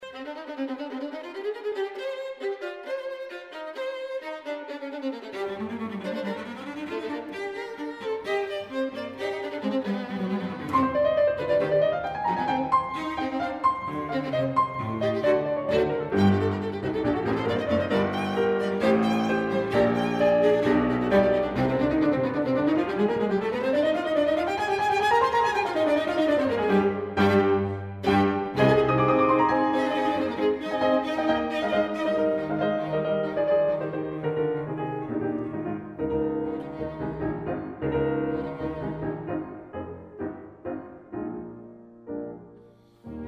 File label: piano trio; dubois